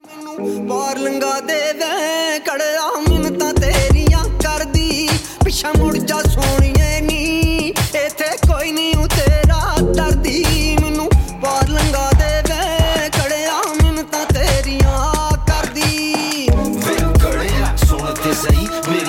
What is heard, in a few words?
Punjabi Ringtone